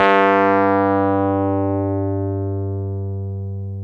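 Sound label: Wurlitzer electric piano G2; Keyboards of The 60's and 70's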